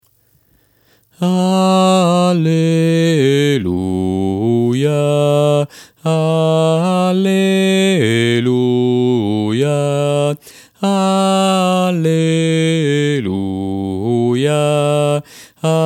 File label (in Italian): alleluia irlandese bassi